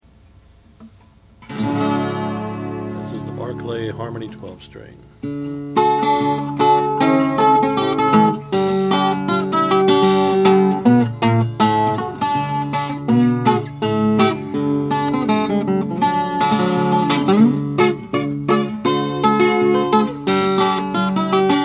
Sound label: harmony barclay h912 brownburst; 1970